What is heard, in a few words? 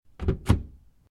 lockpick success; hijack